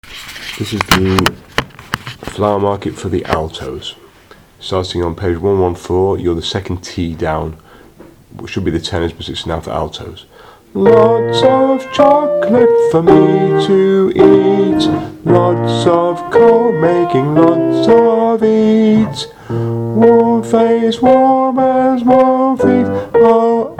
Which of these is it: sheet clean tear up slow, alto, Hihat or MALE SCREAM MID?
alto